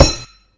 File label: Wood Metal, collision sounds; clockwerk opensim